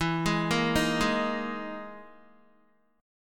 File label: E Major 7th Flat 5th down Guitar; EM7b5 arpeggiated; JGuitar